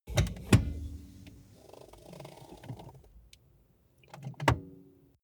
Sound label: car bmw e46 interior seat push back